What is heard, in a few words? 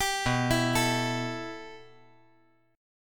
B 7th Suspended 4th Sharp 5th